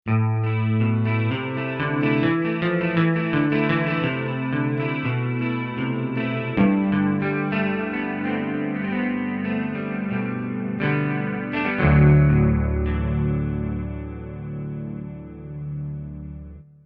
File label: Emo Verser, Guitars; PC3